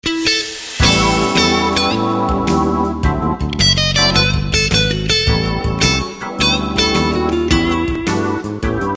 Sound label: SA blues; tech21nyc; CLASSIC